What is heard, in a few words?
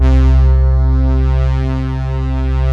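synth Poly Syn; Best Service Gigapack 1; SYN ANA2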